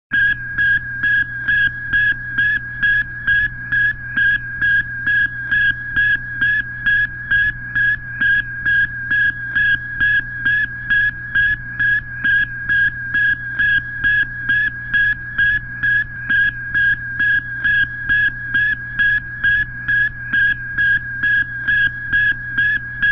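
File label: first Earth satellite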